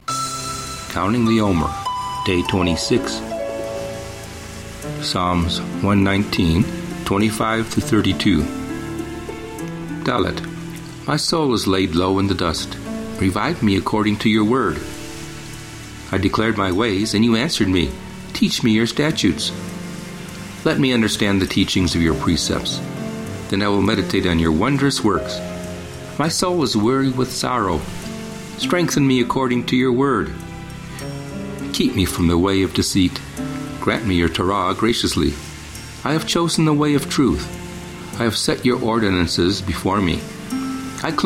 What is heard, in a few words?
Meditative w Waves Ocean; Omer; Psa119